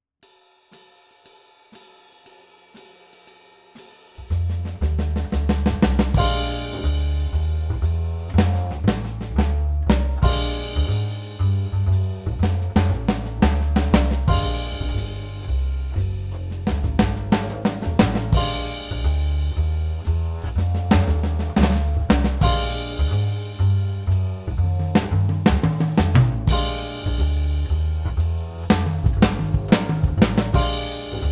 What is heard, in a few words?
Swing, Drum Fills; Essential Drum Fills (Erskine)